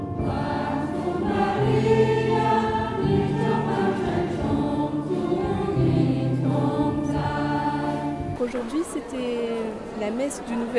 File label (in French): chants en chinois